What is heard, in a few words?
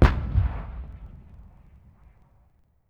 distant gun; WAR